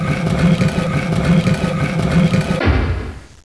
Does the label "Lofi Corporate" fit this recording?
No